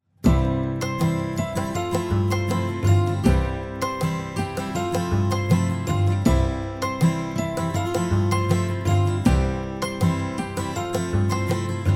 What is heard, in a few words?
Kyrie Instrumental; careme 2023